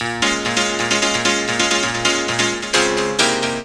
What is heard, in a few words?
Right Piano; M8